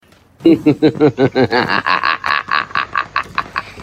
creepy

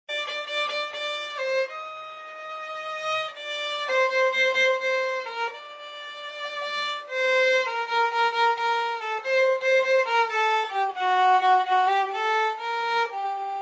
violin; all boys

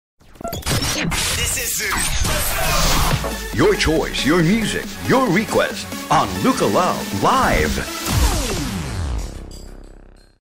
stinger

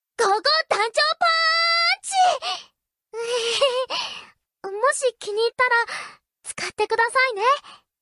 punch; gogo danchou

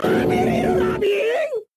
boop

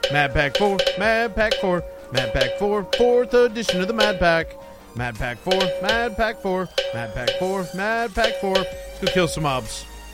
jingle

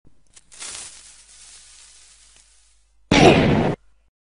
creeper sound

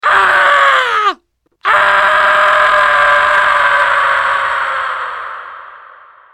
scream fall; spy

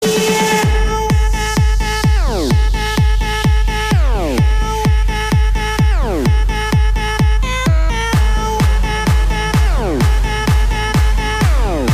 song cats at a rave

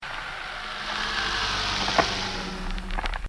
doppler moped

